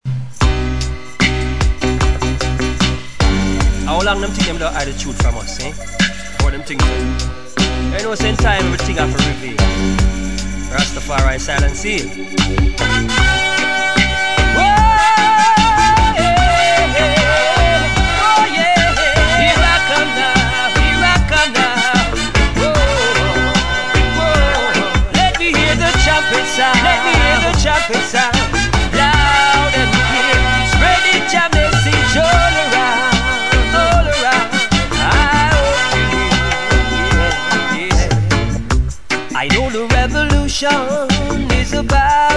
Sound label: trumpet